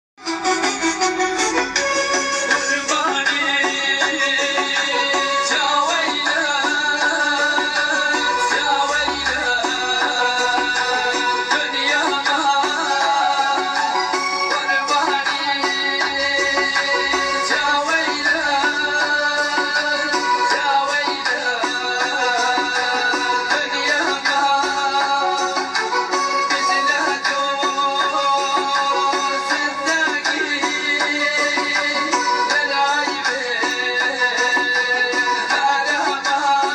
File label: kordi live voice